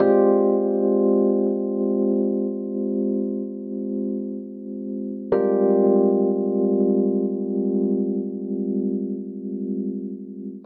Vamp Reflective Ab Major7b5 90 bpm, Electric Keyboards; Ableton; Factory Packs